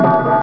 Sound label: METL CLNG